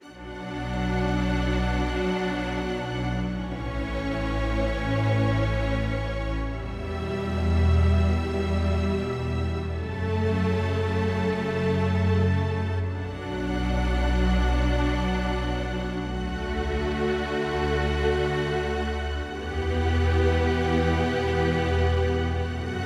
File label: dramatic hasret violin comp; By Burak Sound Bank